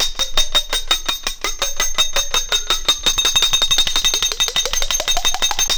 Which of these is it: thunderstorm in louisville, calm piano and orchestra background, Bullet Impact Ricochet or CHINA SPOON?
CHINA SPOON